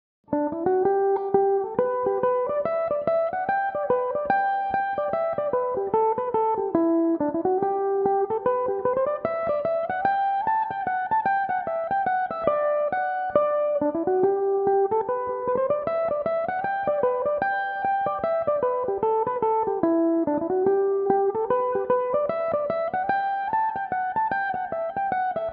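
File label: G major, mandolin; Gordon's Favourite; Belmuse EM-190 electric mandolin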